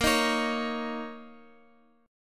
Bb Minor Double Flat 5th Guitar Open F, Bbmbb5 chord; strummed; x,x,x,5,6,8; JGuitar